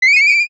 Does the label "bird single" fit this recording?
Yes